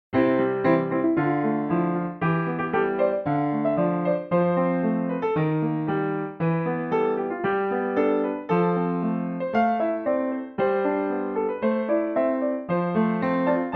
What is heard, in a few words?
Piano